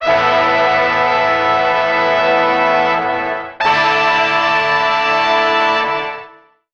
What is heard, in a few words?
horn varience, Stabs